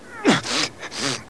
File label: pain; GoldSrc